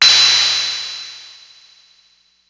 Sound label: CZ Ride, Ensoniq ASR-X Pro